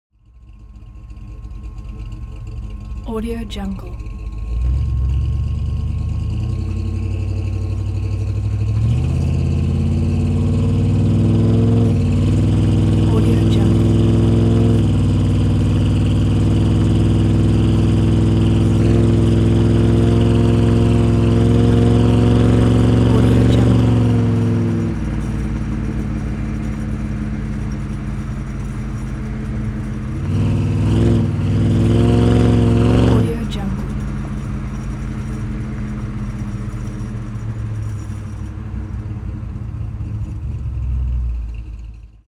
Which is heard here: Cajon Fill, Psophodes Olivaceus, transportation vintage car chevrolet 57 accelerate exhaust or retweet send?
transportation vintage car chevrolet 57 accelerate exhaust